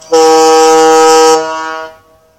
One Prolonged Blast

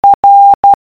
R morse code

